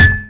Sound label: metal bat; tf2 scout